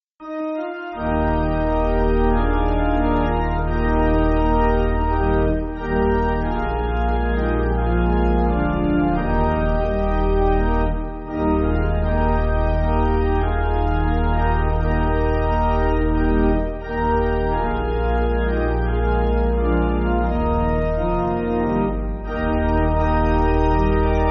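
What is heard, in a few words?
Pipe Organ, Backing Files for Churches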